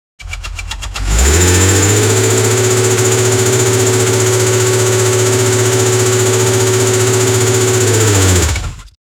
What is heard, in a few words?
flat 4 engine sound full; sportscar